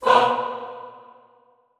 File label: FAH B2, Classical Choir, SHORT FAH; Best Service; Extended Classical Choir